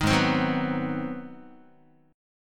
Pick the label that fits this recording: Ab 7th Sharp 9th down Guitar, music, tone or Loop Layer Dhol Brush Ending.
Ab 7th Sharp 9th down Guitar